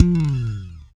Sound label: Bass Slap FX; Roland BK-5; Loops & Phrases